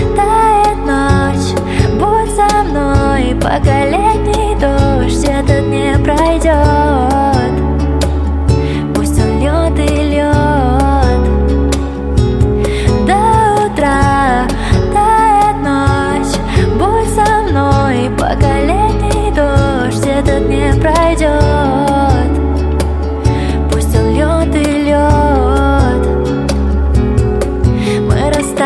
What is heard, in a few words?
acoustic